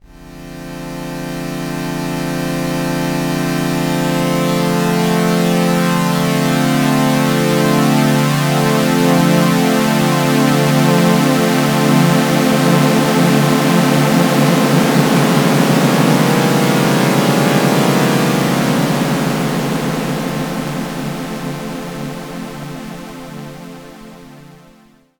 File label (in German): Polyphon Saw Pad Spread; Demon Core